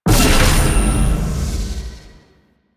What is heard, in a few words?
Small Gate Swap, Forest; Grineer; Warframe; Veilbreaker; Grineer Forest Thumper; Kahl